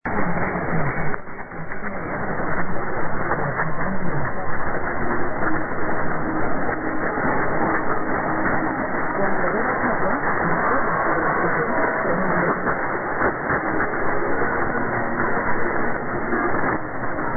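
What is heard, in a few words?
RNE1 Spain ID, radio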